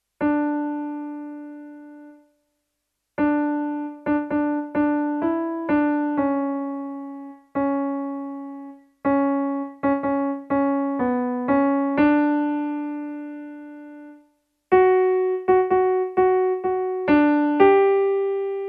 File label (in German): Seht wie die Sonne (bass)